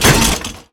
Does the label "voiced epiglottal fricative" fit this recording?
No